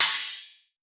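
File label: Short Chinese cymbal, Metallic Percussion; SN-R8-11 Metallic Percussion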